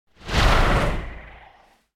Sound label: Whoosh Thin And Fast Pulsate; Hollywood Edge; sound designer's toolkit v2